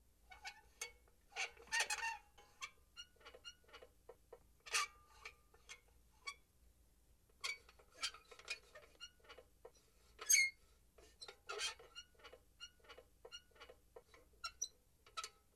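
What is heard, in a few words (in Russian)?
качели скрипят от порывов ветра; Звук качелей раскачивающихся на ветру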